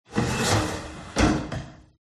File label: close drawer oven; fox audio